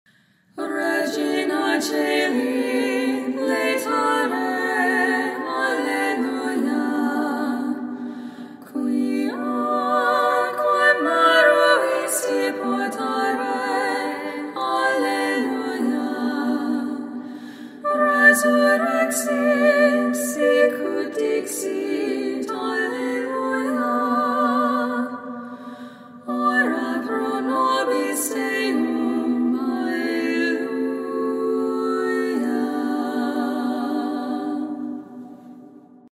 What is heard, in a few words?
Regina Caeli Marian Antiphon gregorian chant catholic; prieres a la vierge marie